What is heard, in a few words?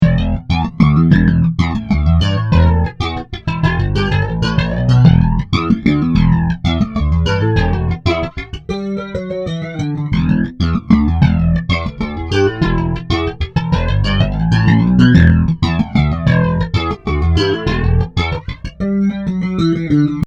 Flange Cho